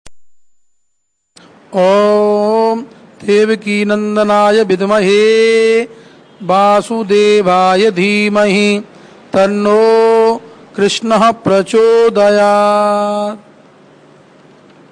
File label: krishna mantra; power stone